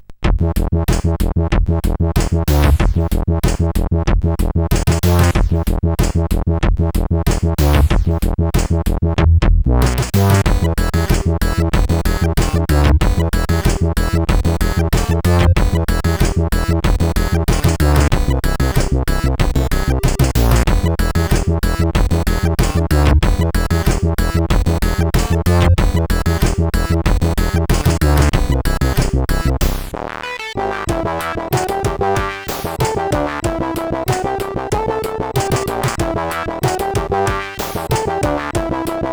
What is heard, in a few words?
CSG8580; Glitch4K